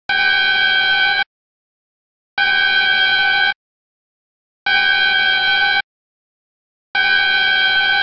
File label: air fanfare classic; bosch